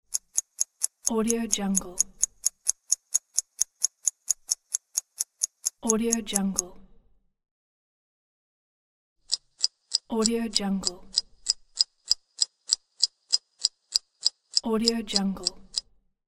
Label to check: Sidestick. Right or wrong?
Wrong